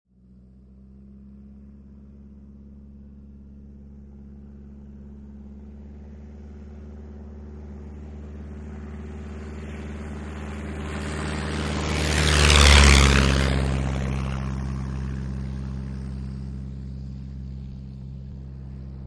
Tiger Moth: Runway By; D.H. 82 Tiger Moth. Raspy Engine, Medium-speed By. Long Away, Steady Engine. Medium Perspective. Prop Plane.